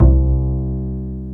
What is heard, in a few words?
STR Cb Pizzicato; Roland L-CD702